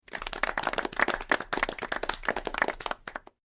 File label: claps